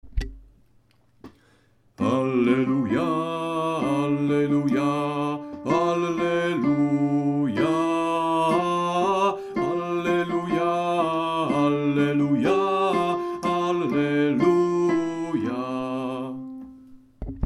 Alleluja alt

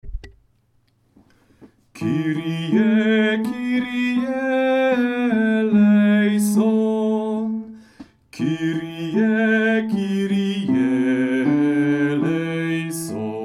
Kyrie tenor

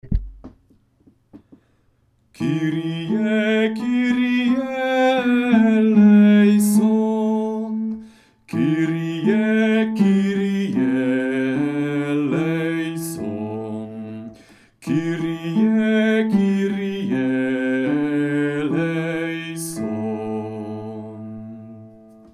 Kyrie bas

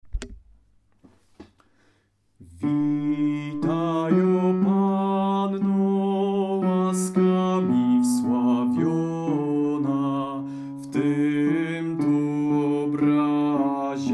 Witaj panno bas

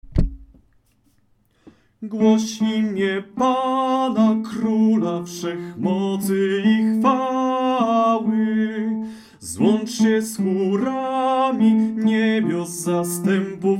Glos tenor

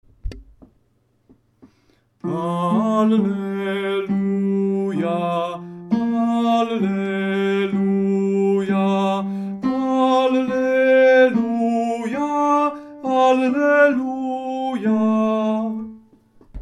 Alleluja tenor

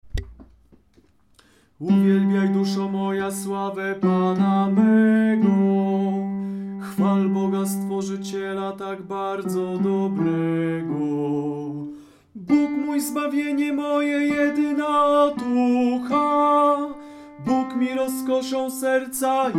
Magnificat tenor

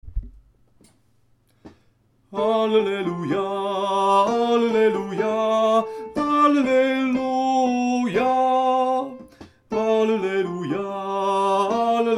Alleluja sopran

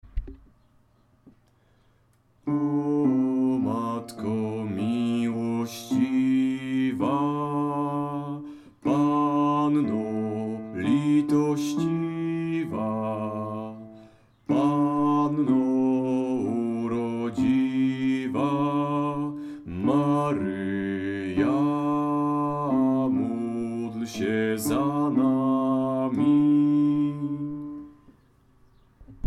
O matko milosciwa bas

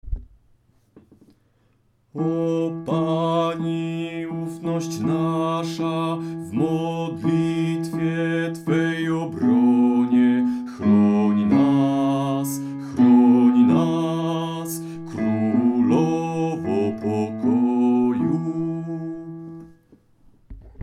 O Pani ufnosc nasza bas

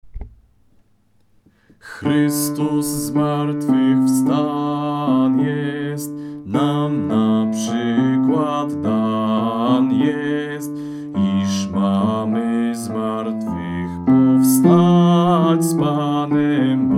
Chrystus zmartwychwstan jest bas; pasyjne

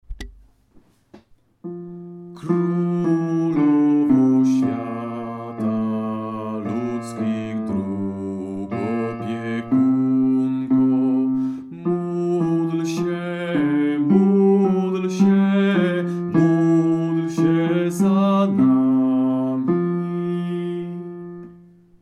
Krolowo bas

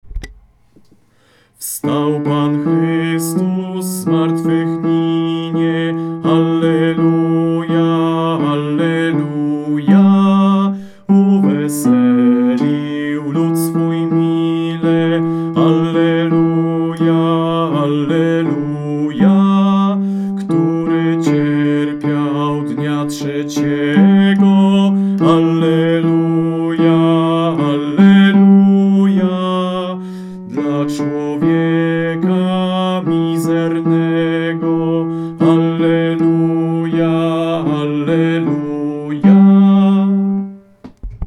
Wstal Pan Chrystus baryton; pasyjne; meskie

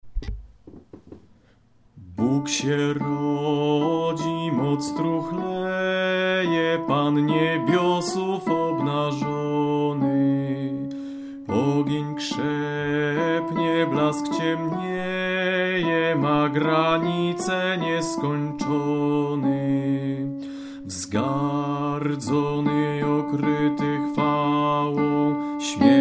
Bog sie rodzi alt; koledy

